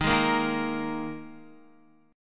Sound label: Eb Suspended 4th Eb Guitar 4E 4A 5D 5G 5C 6F; Ebsus4 strummed; JGuitar